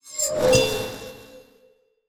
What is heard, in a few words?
Hero Craft; portal quest; android